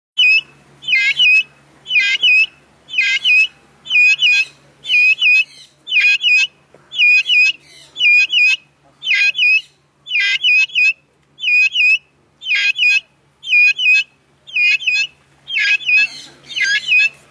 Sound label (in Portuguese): canto da pintada vulturina